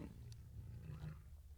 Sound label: SLEEP